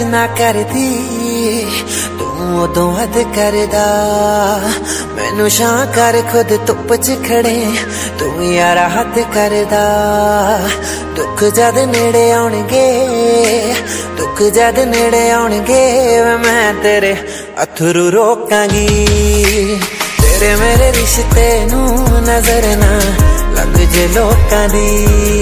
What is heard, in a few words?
romantic ringtone, bollywood